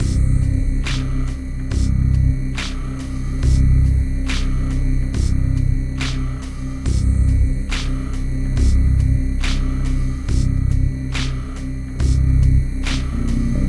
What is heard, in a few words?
ambience; Mirror's Edge (2008)